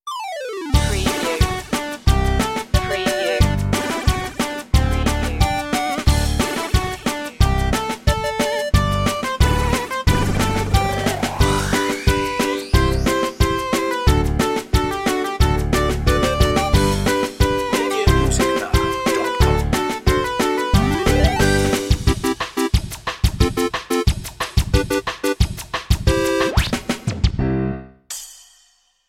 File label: retro video game bubbles